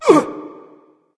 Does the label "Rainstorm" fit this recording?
No